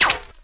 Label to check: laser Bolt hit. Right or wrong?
Right